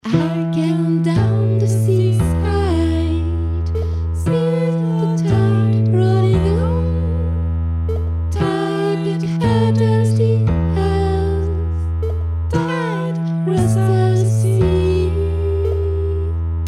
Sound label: refrain soprano